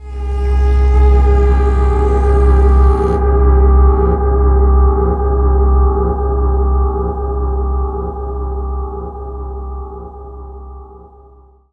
Arabian Drone; Jungle; Blu Mar Ten - Jungle Jungle - 1989 to 1999 Samplepack

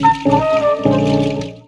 Flutes Flute; Jungle; Blu Mar Ten - Jungle Jungle - 1989 to 1999 Samplepack

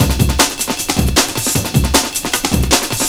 Jazz Note Break; Jungle; Breaks; Blu Mar Ten - Jungle Jungle - 1989 to 1999 Samplepack

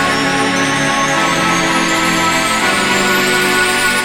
Pad, 10B; Jungle; Pads; Blu Mar Ten; Jungle - 1989 to 1999

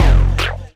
Spring Bounce; Jungle; FX; Blu Mar Ten - Jungle Jungle - 1989 to 1999 Samplepack

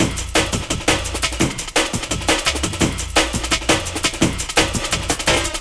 Break; Jungle; Breaks; Blu Mar Ten - Jungle Jungle - 1989 to 1999 Samplepack

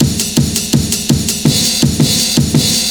Amen; Jungle; Breaks; Blu Mar Ten - Jungle Jungle - 1989 to 1999 Samplepack

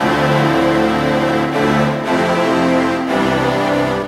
Pads, 7A; Jungle; Blu Mar Ten; Jungle - 1989 to 1999

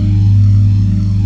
Lo Pad, Jungle; Pads; Blu Mar Ten; Jungle - 1989 to 1999

